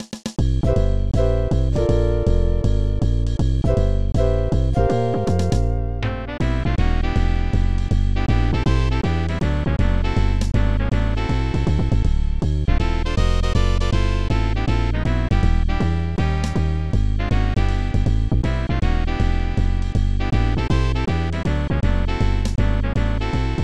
Mini-Game Menu; Ganbare Goemon 4; SNES

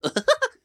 Jump; Collector

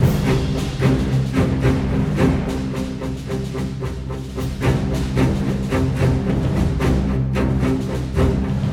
sounds music; Halo Combat Evolved; Xbox

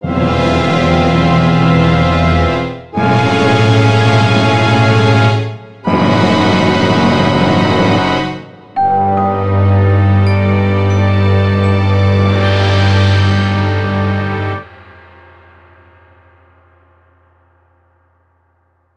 Sitar

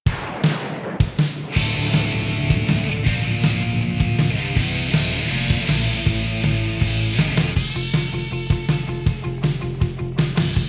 Music Rhythm; hot lava; ios macos windows

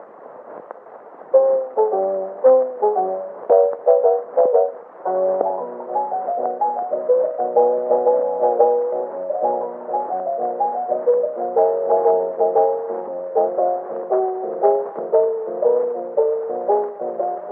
Amb Musique

